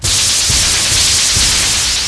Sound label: venom swamp